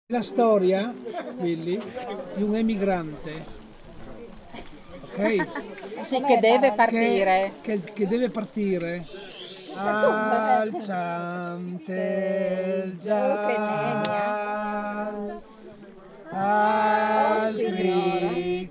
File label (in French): chant du frioul